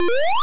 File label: jump; sonic the hedgehog